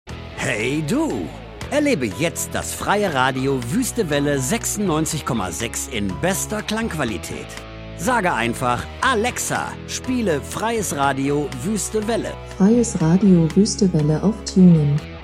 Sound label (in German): jingel mit Musik